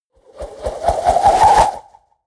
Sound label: whoosh flutter